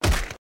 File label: hit; Ben 10 - Tomb Of Doom